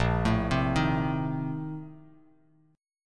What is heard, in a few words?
Bb Major 7th Suspended 2nd Suspended 4th, Bass Standard; 6,12,10,8; arpeggiated